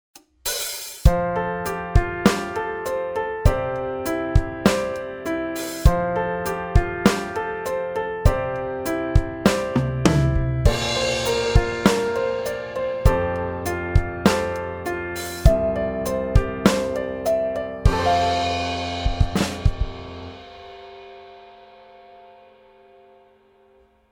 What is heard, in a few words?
Pop Ballad Piano Chord Progression